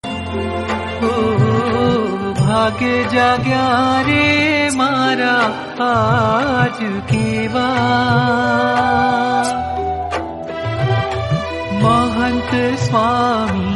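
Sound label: kirtan bhajan, gujarati; baps; mahant swami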